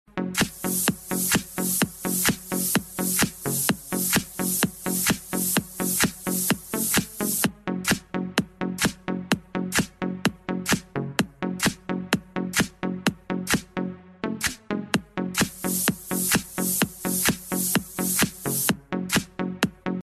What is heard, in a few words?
flat beat ringtone